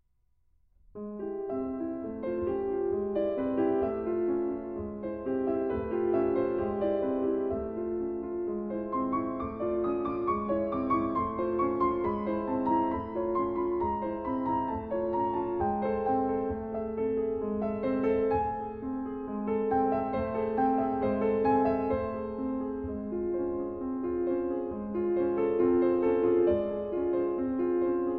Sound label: Solo Piano Perpetuum Mobile; Partita For Solo Piano I. Perpetuum Mobile